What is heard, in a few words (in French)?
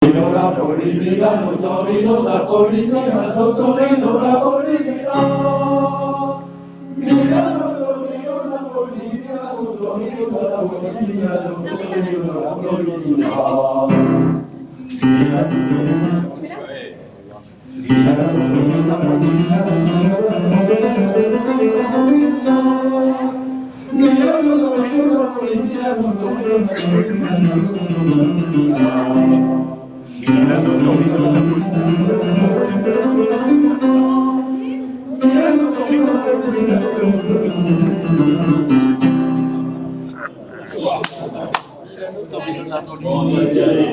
hommes, travail vocal